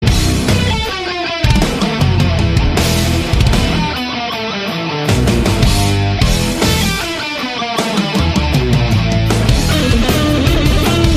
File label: Riff